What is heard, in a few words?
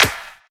Claps & Snares